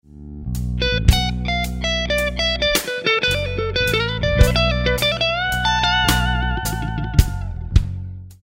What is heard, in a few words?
Lick, guitar; Slow Minor Lick 9